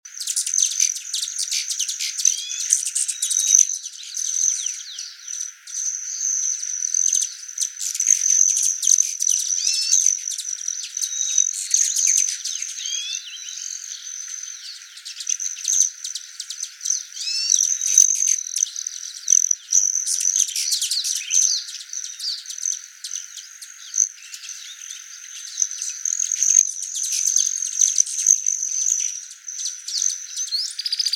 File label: Broad tailed Hummingbird Sound